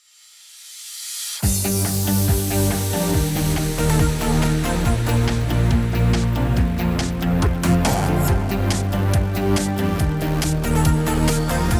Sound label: Instrumental